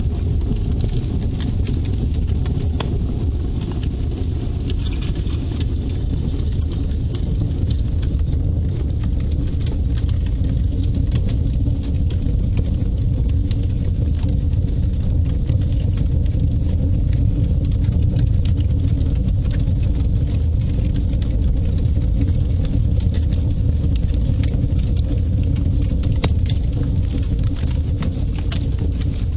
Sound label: fire and fireworks sound fx